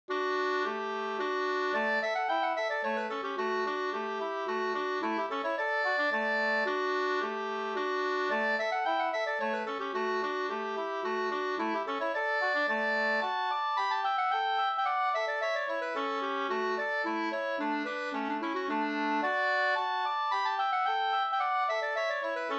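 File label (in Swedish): slangpolska, two-part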